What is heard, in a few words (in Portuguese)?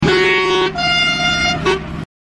Buzina; Comboios